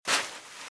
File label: Footsteps Sand Dry Walk Right; RadwinIsland